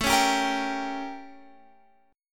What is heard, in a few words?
A 7th Flat 9th Guitar Open F; A7b9; strummed